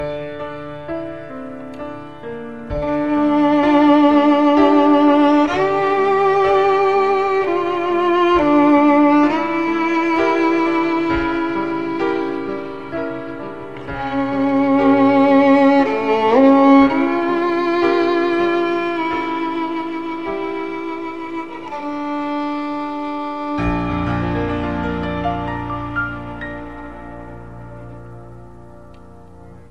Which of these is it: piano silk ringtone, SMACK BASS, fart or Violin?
Violin